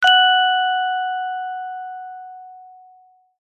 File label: multimedia notification bell chime ping long decay